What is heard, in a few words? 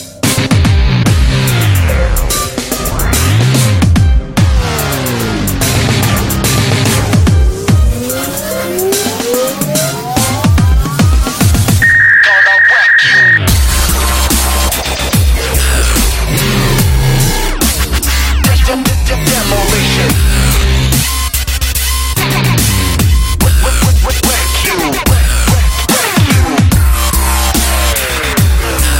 Dubstep